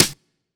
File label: KIT, Dance Mega Drums, HIP; Best Service